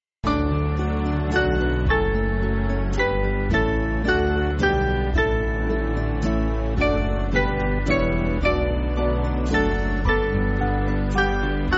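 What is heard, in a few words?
Band, Music Backing Files for Churches; Jesus To Thy Table; Tyholland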